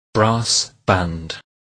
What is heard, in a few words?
brass band